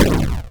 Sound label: player land